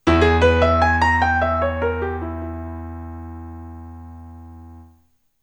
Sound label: Jingle, SC-88; Princess Maker Faery Tales Come True